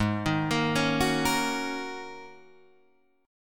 G sharp 7th Suspended 2nd Suspended 4th G sharp Guitar DADGAD